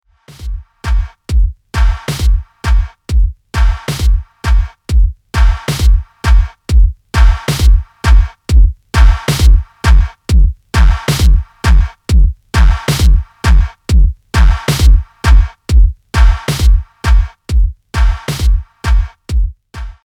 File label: Drive Gain 3dB